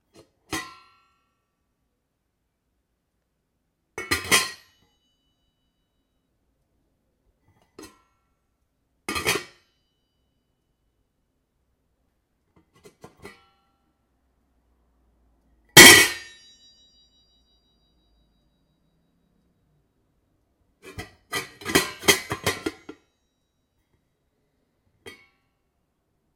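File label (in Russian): kryshka kastriuli, pot lid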